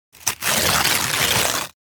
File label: Tear paper slow